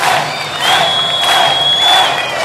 Crowds; Vengeance Samples; VEC2 FX

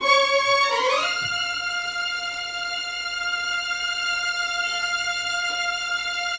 str f, strings; house; PS-28 Vocal House Samples

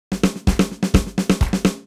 Fill Ins 128BPM; Vengeance Samples; VEH2 Loops

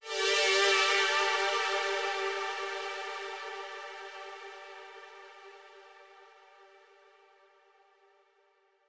Atmo G; Vengeance Samples; Bonus Sounds

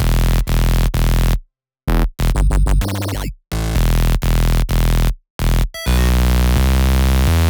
Knight Bass, 128BPM; Vengeance Samples; Knight Kit

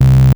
Synth Bass; Vengeance Samples; VEE Synth Shots

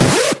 Synths G#; Vengeance Samples

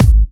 Hard Kicks; Vengeance Samples

